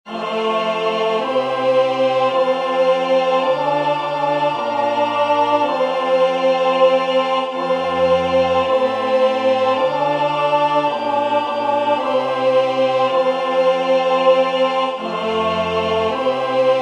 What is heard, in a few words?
Kyrie tenor; singing divine service iii in parts